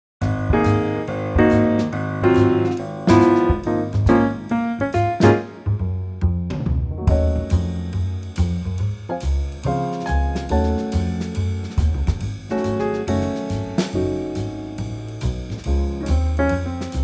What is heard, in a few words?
Db Trio